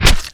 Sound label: Arrow Skin, Damage